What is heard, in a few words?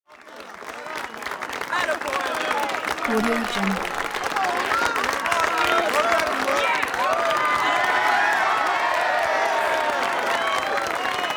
crowd cheers short burst of applause clapping; prosoundfx